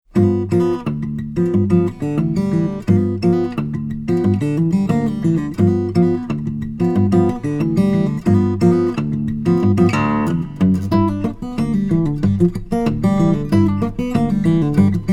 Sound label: Rio Shuffle